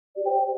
stupid discord ping

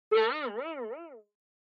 boing sound effect

